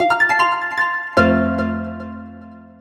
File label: Text Notification